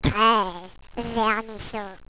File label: children defeat; valve